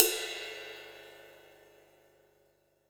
RIDE, Drums Acoustic

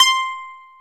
BAGLAMAS C 5

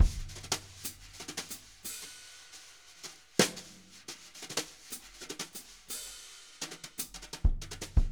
jazz brush loops

